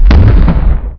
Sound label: sboom; boglord; quakemapping; mod xj18